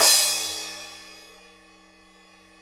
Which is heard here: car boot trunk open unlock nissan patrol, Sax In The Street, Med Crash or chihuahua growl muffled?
Med Crash